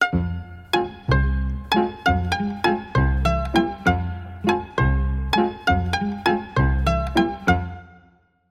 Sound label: Playful Pizzicato